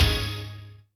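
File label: Orchestra Hit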